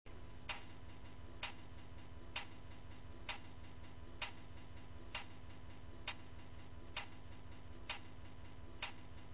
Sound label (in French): temp horloge